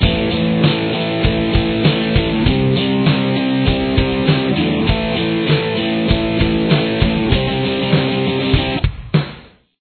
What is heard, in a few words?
chorus guitar